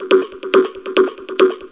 140 hexbeat, DRUM LOOPS and BREAKS

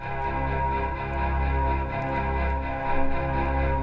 stringy loopy, STRINGS ORCHESTRAL CLASSICAL LOOPS

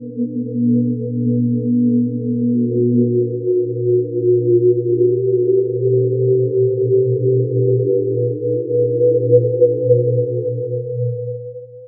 drifting sines; WORLD

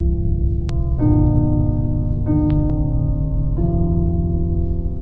096 piano click abstract chord; KEYS ORGAN PIANO LOOPS

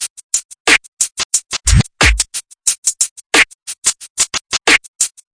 swing hat snares, 090 bpm, drum loop

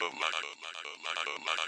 filterstutter, vocal or voxy loops

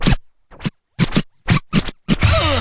turntable uh, Scratching and Turntablism; DRUM LOOPS and BREAKS

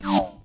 Pzang, Cyborg Kit

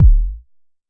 Jomox MBase, drum machine; Jomox MBase 01

